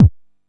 fat kick one shot a sharp key, Royality free bass drum sample tuned to the A# note